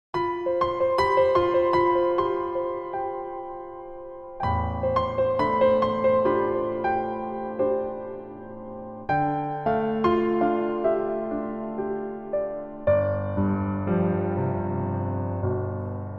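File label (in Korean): Piano version 멜로디MR 여자키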